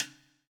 PWR RIM

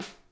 snare, drum kits

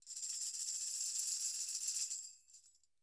TAM ROLL, PERC; Sampleheads; NYC Percussionworks; SF PERC VOL1

